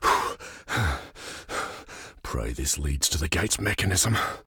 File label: leads; Bascileus